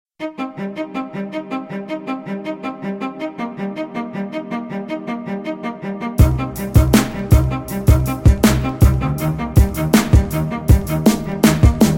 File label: Violin; Gravity Falls